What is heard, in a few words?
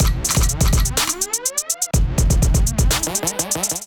groove 124 bpm; Emergency Kit